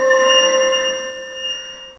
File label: soft magical chime ambie; floating